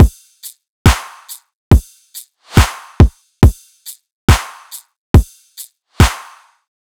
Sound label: Riddim Drum Loop 140 Full